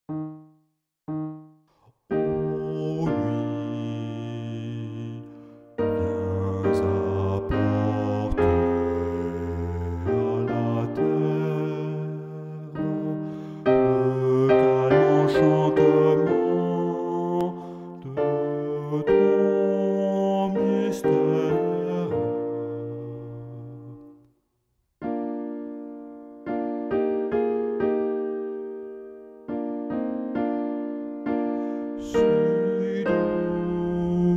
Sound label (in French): basse